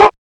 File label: fx one shot bark